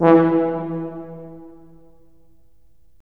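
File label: brass tuba; LA Composer vol. 3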